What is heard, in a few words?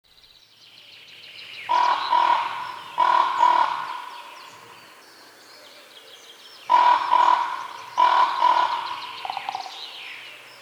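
voron golos, raven voice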